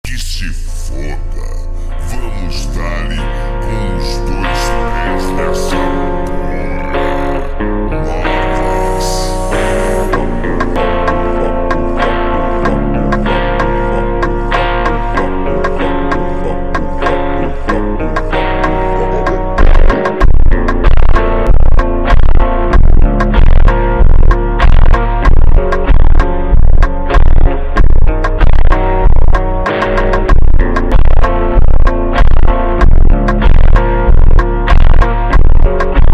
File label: Alt Version super Slowed